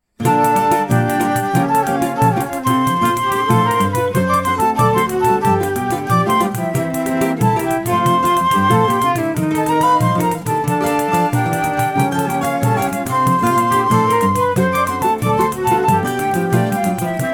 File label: songbook instrumental